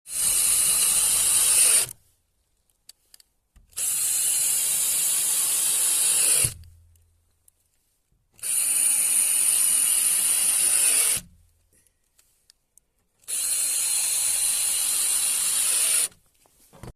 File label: screwdriver; پیچ گوشتی